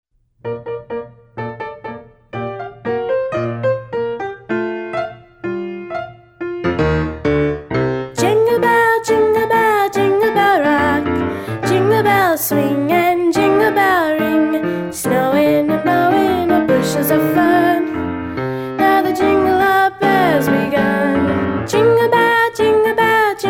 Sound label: vox